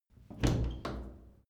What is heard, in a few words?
old door open